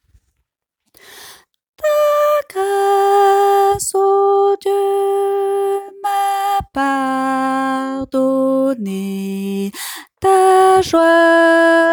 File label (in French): basse; chant 166